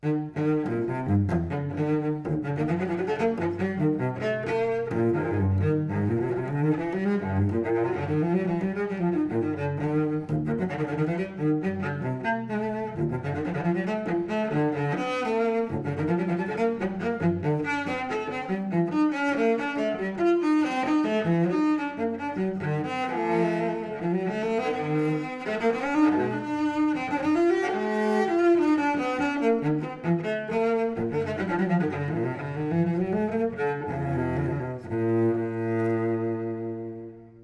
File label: Cello; EQ Matched; Wayne